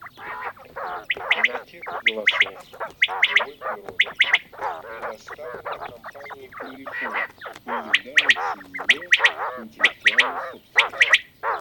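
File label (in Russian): Перепел Quaglia, chip mini для манка; plurifon; chip mini 20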